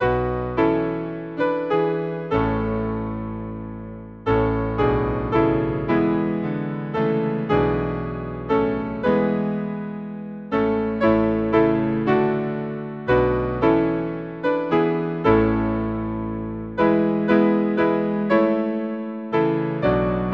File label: Hymn piano; Lord of all being throned afar; Mendon